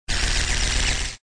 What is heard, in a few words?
Back Spin